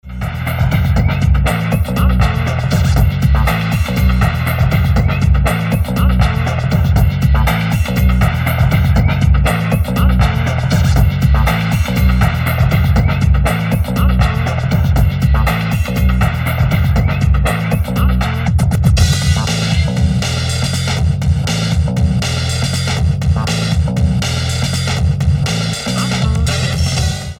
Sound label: spy loop